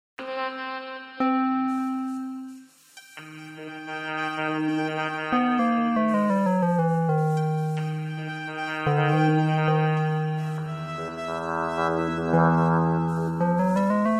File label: Tone Maze